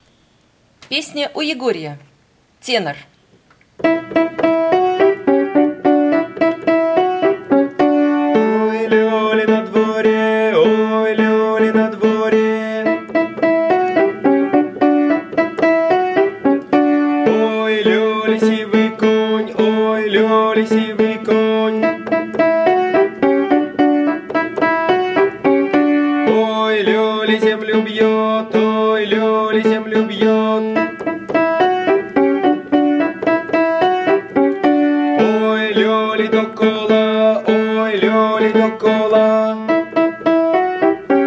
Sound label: tenor; U egoriya